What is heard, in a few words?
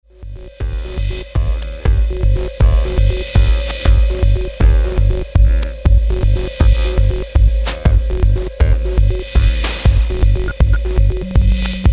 Drone Operator